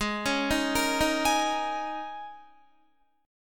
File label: Ab Suspended 2nd Suspended 4th Ab down Guitar; arpeggiated; Absus2sus4